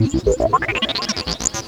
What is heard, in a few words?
rhythmizer, Drum N Bass; Sonic Foundry (Sony Creative Software); Knutrix Drum & Bass